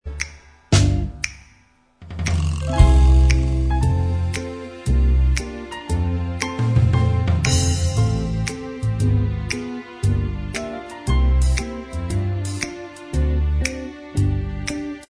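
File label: instrumental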